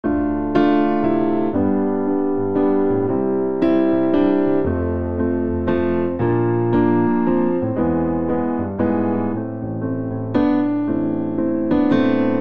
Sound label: Progression Turnarounds 3/4 A Minor 116 bpm, Grand Piano; MIDI Clips; Ableton; Factory Packs